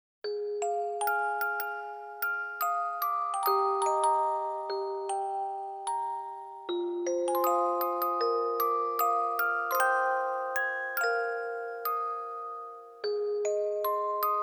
Orgel Ver; Saishu Shiken Kujira original sound track; ディアノイア

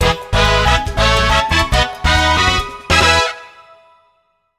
JINGLE High Score; wii party the unofficial soundtrack

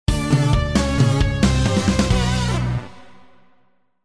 Lose; Juanito; Juanito Arcade Mayhem; Android

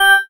Combo

